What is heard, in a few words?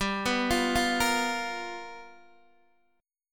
G 7th Sharp 9th G chord, Guitar DADGAD